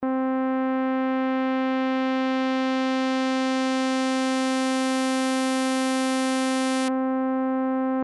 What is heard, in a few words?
ADSR Filter Env; synthese sonore les enveloppes